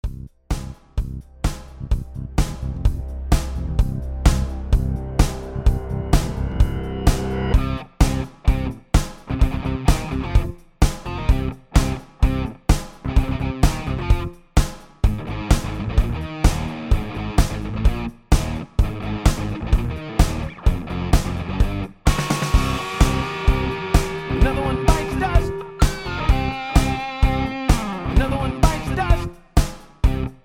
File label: Instrumental with Harmony